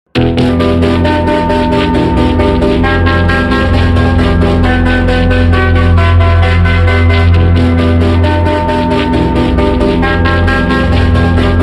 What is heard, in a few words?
slowed reverb